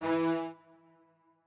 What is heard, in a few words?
strings